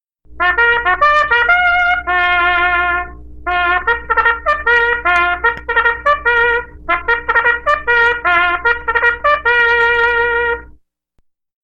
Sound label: Mens Meal Call